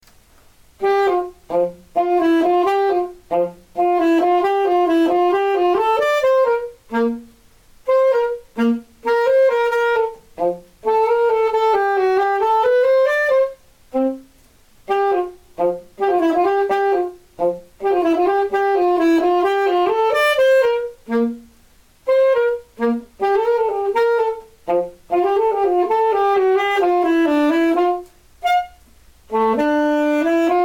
sax

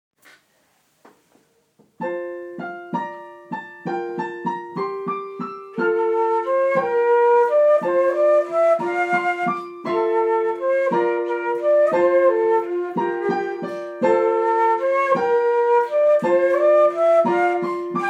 off she goes trad irish

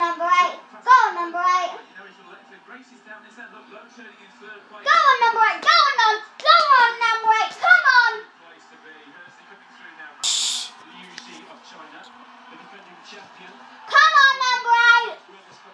little one cheering; teamgb swimming

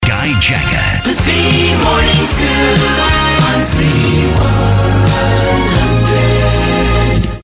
sung jingle

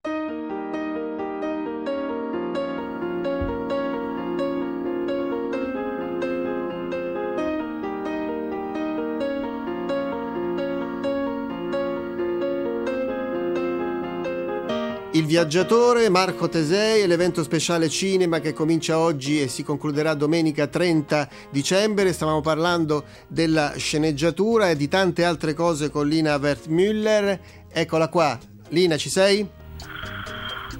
snoring on air; lina wertmuller

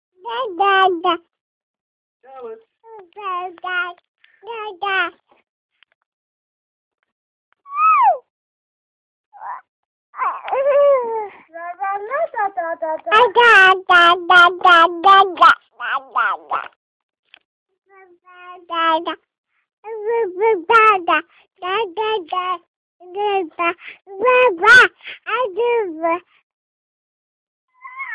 baby babble

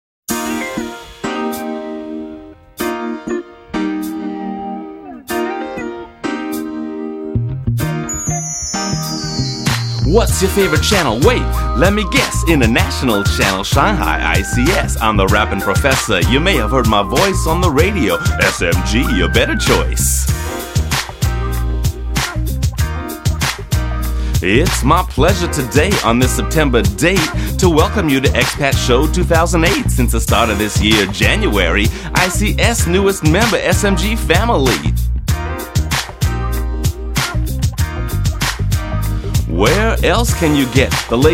rap

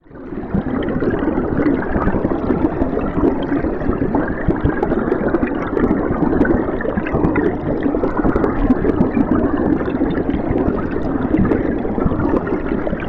tollcross burn

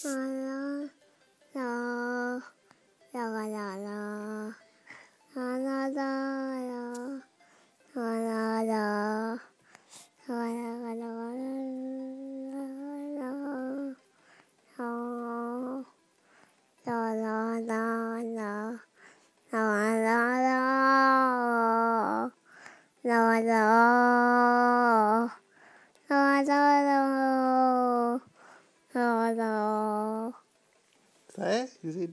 morning song

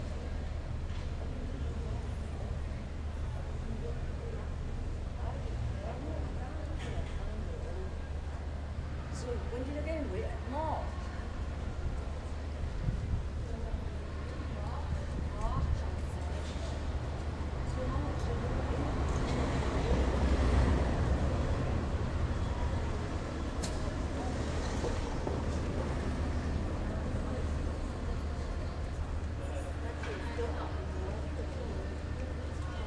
sheffield town hall clock

